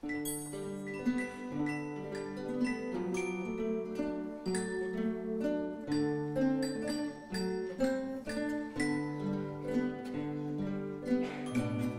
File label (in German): Kinderorchester